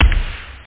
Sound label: bdrum; Amiga 8-bit Sampled Voice; 8,363 sample rate